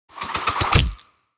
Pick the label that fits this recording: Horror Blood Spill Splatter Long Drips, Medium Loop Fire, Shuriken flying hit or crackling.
Shuriken flying hit